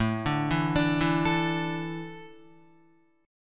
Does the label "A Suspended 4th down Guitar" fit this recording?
Yes